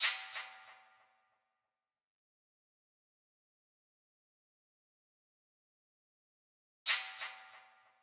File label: Drum Loop 140 BPM Hit; Loop Stems; Cymatics; Mystery Pack Vol 4; Birmingham